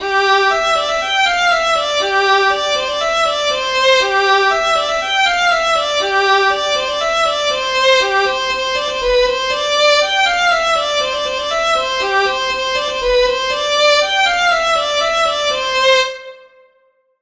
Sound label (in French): polka piquee de bethmale